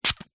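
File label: household perfume single pump spray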